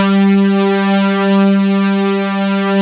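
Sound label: Jupiter 6 BUZZ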